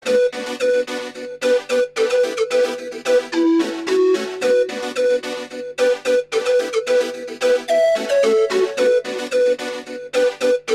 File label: Summer Flute